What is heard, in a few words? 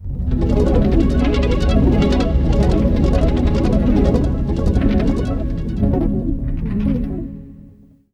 swirldrum; Sonic Foundry (Sony Creative Software); Ugly Remnants VOL-2; Beatstuff